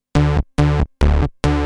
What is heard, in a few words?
pw bass; Techno Music Maker